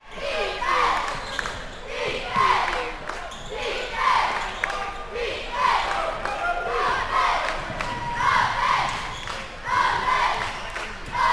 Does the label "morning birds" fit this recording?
No